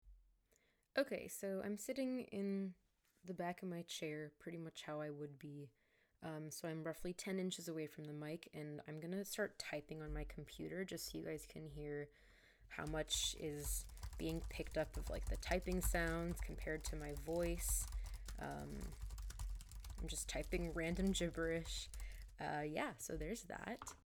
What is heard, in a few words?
typing; Razer Seiren Mini